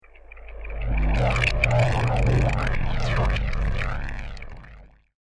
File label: Alien Drone; Alien Sound Effects; science fiction sound effect